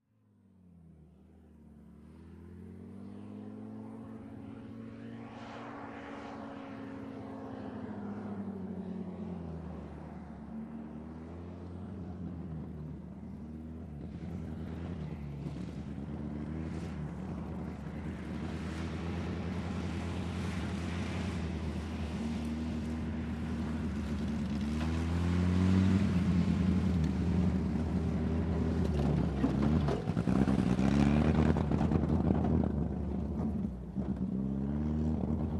Army Jeep Var Driving

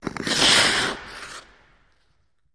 Ice Skating Stops

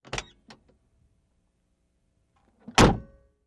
Car Door Oc Small Car L

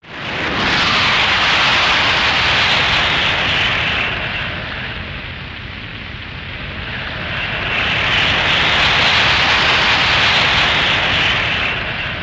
Ripping Air Tone, ghosts

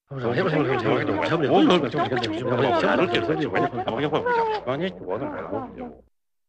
Small Mixed Group, reactions

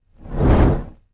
Fire Ball Catapulted

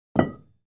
Free Weights Exerci

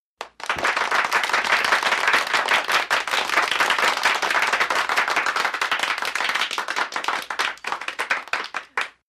10 Women Enthusiasti

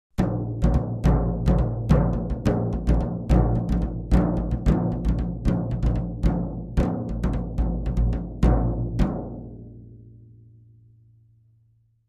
Drums Toms Rhythm, drums tom

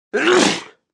Adult Walla Wet Sneez, male

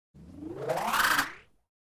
Short Synth Rising, synth tones

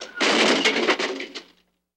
Car Crash Light

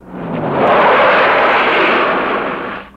Car Skid On Dirt Mono

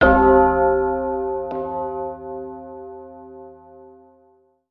Clock Chime Big, stinger

